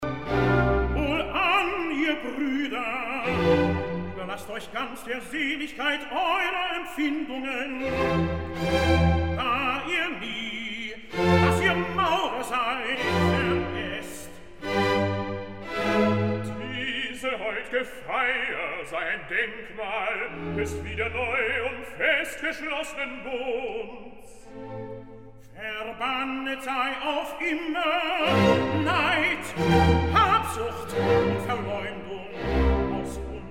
Recitativo; Mozart; Oratorios, Cantatas and Masonic Music; Laut verkunde unsre Freude; KV623

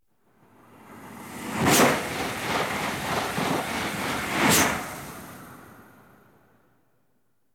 Diesel Trains Train passes; The BBC Sound Effects Library

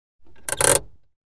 int Handbrake on, Cars; BBC Sound Effects Library

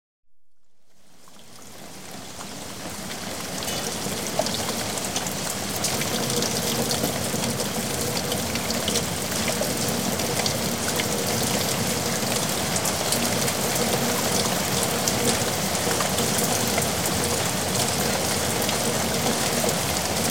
Heavy rain in yard (with gully noise); Weather; The BBC Sound Effects Library

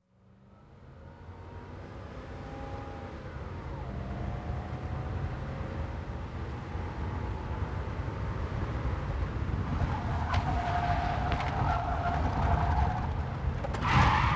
Car, Emergency Stop (Interior Aco; BBC Sound Effects Library

